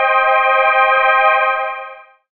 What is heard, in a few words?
pad, Dance Synths Break Pads